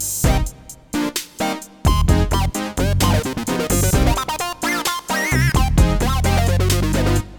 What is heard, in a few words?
ob8 minimoog memorymoog